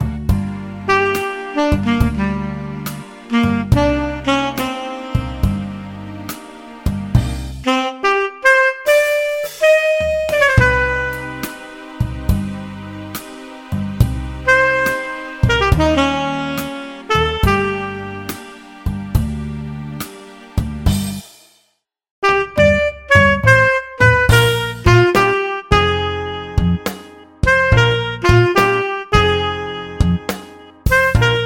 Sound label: tenor saxophone